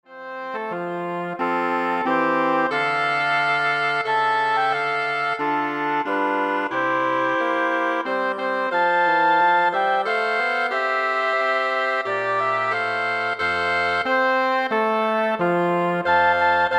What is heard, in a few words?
Woodwind Ensemble